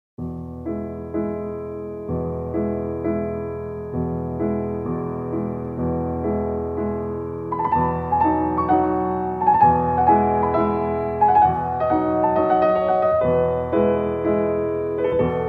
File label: Wedding Procession